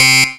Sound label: Dance Mega Synth; Best Service; 90 s Sample CDs